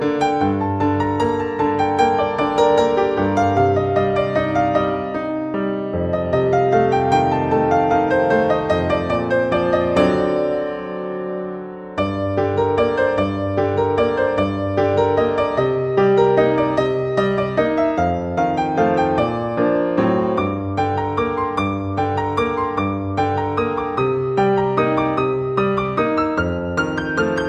Marriage music piano

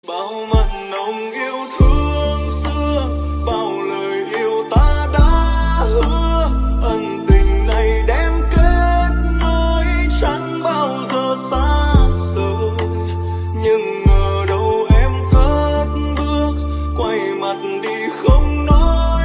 lofi ver

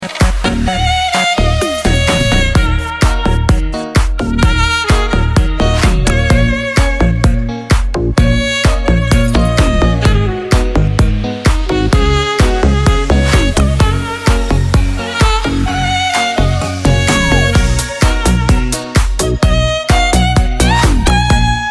saxophone remix

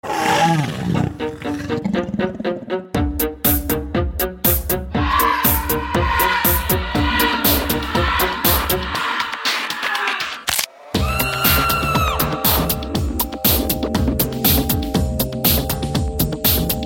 Halloween Groove

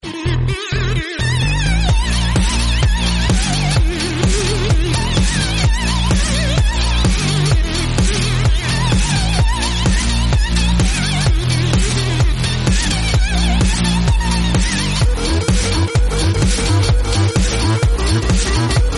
Halloween Electro

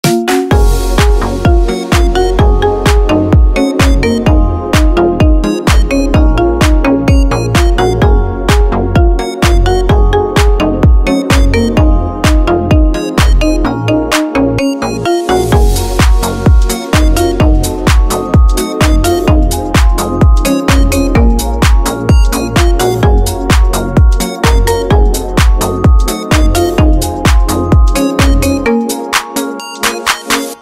song marimba ringtone; nhac chuong

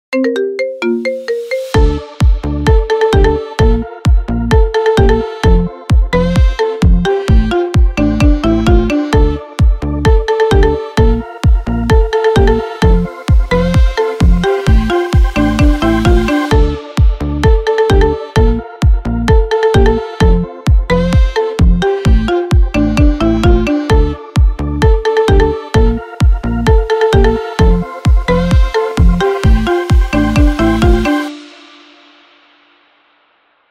iphone sax remix; nhac chuong

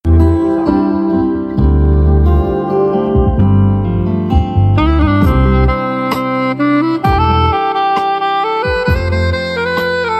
Saxophone

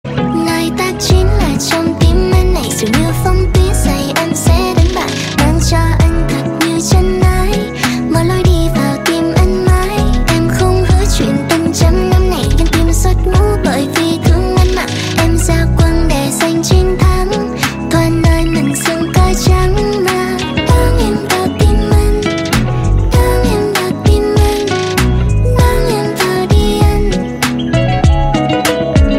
drum

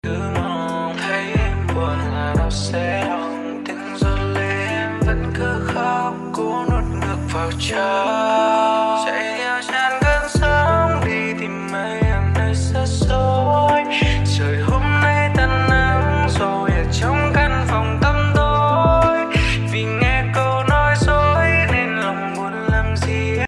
lofi

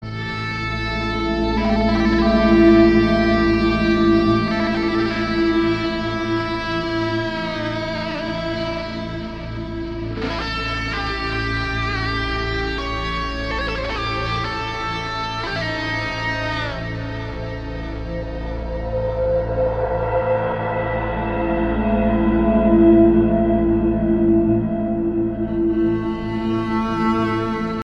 meditation guitar; nhac chuong

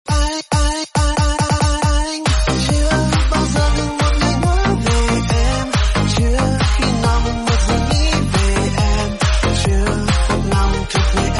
remix

